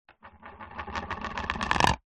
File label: household plate plastic childs spin table